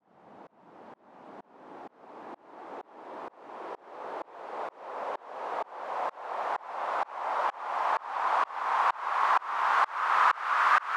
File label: Long Sweep, White Noise